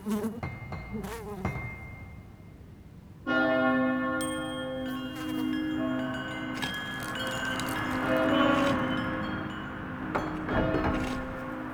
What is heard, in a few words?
Exterior Foreground Alley Loop, ambience; Duviri